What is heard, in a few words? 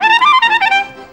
CLARI GROOVE; Best Service; World Colours